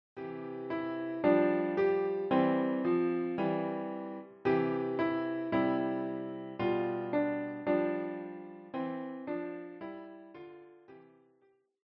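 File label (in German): Klavier; Hänschen klein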